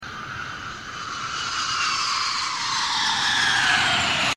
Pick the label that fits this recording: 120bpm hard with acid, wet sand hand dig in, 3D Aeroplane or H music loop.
3D Aeroplane